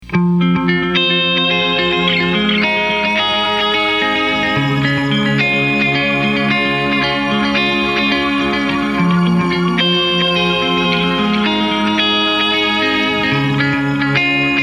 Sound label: Fly Elevation Intro; trabant